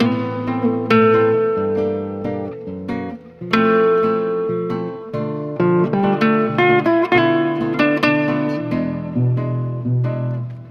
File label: guitar spanish, Loops